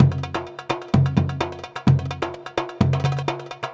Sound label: Percussive Loops